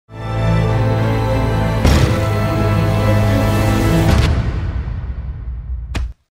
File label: Cinematic Music; Town of Salem 2; Deputy